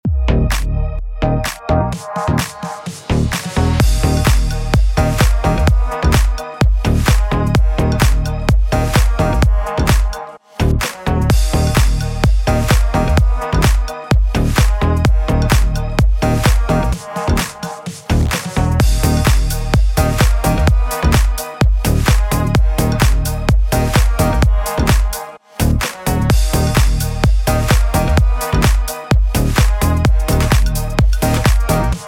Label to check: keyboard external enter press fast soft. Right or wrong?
Wrong